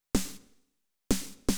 SNARE HOUSE 125; eJay

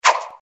Ice Ball Throwed; bob espo

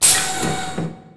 station door; jenquai; Earth and Beyond; EA Games

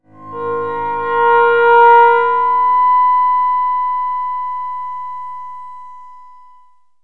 Suspense Accent; KABOOM! - Wild and Wacky Sound Software; Music; Drama & Mystery

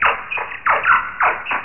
large rat; amigamud

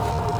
scope run; Soldat

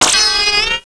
magic; duke nukem total meltdown

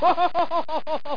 laugh

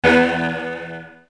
EMPTY; MS PLUS!

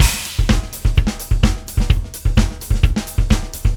Dance, Drums; Loops; ACID MUSIC

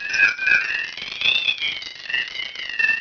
Pong; breakout

